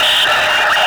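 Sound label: Military Backpack Shutdown; Objects; Storage Container; Warframe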